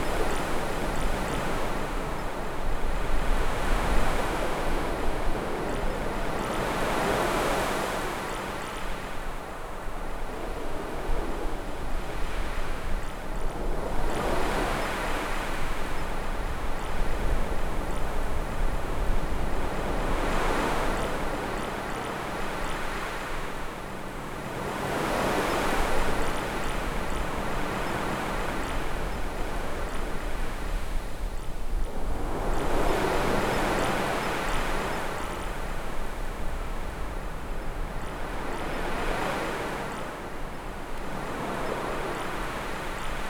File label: beach atmo light waves se